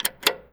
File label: SWITCHES; AKAI Anime Sound FX